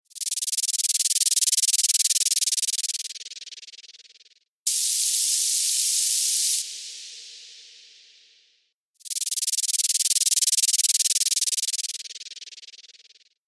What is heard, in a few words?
Noise Engine Purr; Undulator